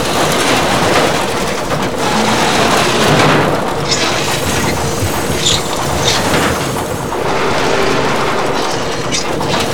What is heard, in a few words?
bone ramp scrape loop; Modern Warfare